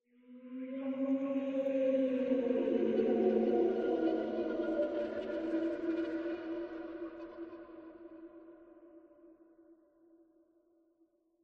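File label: random laughs; ambient; Half Life Alyx; xen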